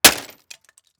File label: Impact Plastic Smash; Impacts